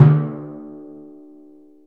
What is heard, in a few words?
PRC TIMPAN, Timp Roll Dry; Roland LCDP03